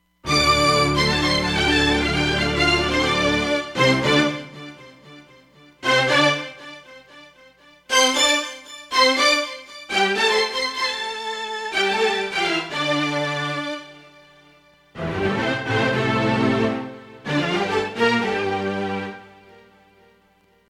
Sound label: 70`s TV Show, Roland Fantom XR